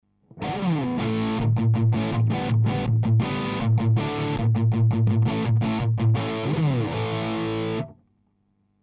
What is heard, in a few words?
rhythm; KSM313 front